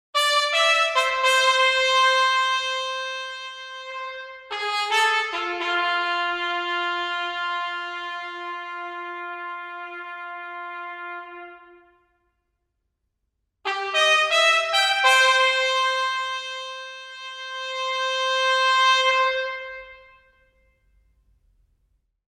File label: Orchestral Trumpet Sus; Orchestral Tools Glory Days